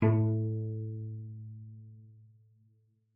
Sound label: cello pizz A2; 90 s Sample CDs; Xsample VOL-5